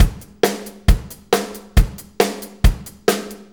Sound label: 4-4 Loop, Drum Loops, Full Kit Loops